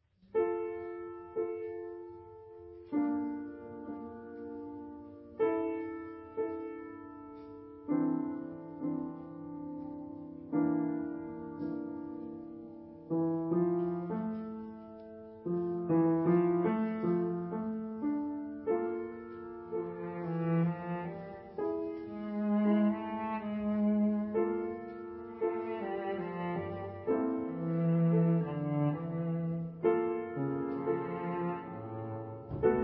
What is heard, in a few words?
rhapsody for cello and piano; jan freidlin